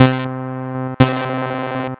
Synth Ultra Bass

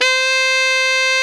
TENOR MF C 5, Saxophones; Greytsounds; Philip Wolfe David Crozier's Saxophones

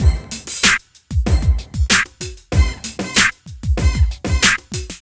Club Tip, R&B Drums

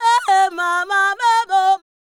Female Vocal 100bpm G Dry, African Voices; Quazibeat; African Secret

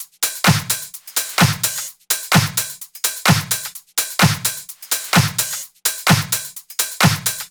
Loops 128BPM, Drumloops

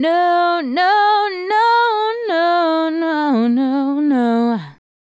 Female Solo, Hip-Hop and RnB Vocals

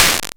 Crushed Perc, bitcrushed; musicradar

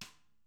snare, Interactive Drum Kits; Sonic Reality

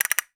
castanets

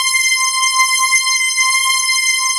Dream String Synt, C; E-MU Producer Series Vol. 5 – 3-D Audio Collection; 3DSynths; 3DreamStringSynt

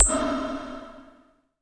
PERC FX, Dance Drums; Best Service; Dance Mega Drums

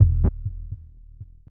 echo, Drum N Bass; Sonic Foundry (Sony Creative Software); Knutrix Drum & Bass

